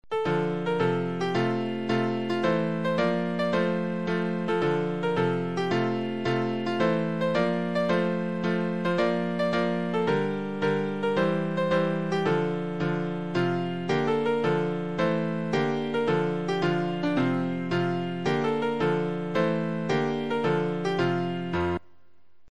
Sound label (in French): Melodie